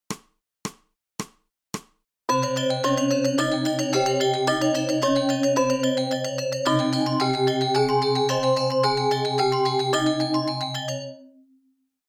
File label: scale